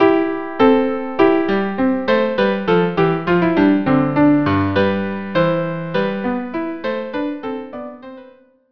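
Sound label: violin, cello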